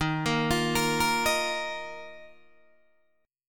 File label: D sharp Suspended 2nd, Guitar, DADGAD